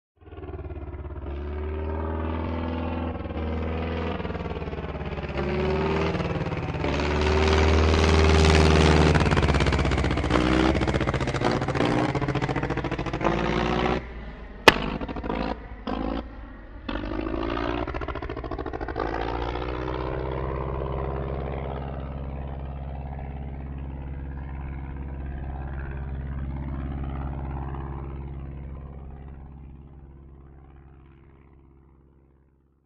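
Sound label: Backfire In Distance, One Sharp, Isolated Backfire During Idle At Close Range, Engine Steadies With Away. Medium To Distant Perspective. Prop Plane; Taylorcraft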